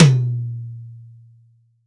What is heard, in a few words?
HTOM, STUDIO TOM; MIX2 HTOM